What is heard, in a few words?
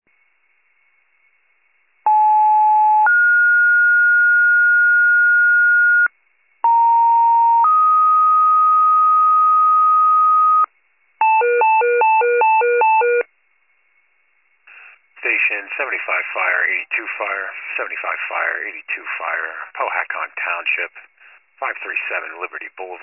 FIRE pager alert